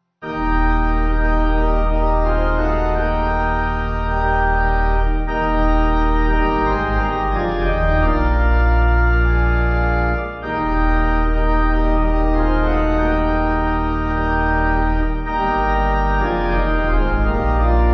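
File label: Pipe Organ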